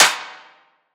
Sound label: clap tail, One Shots, Claps; Drum Sample Pack; Slate; SEISMIC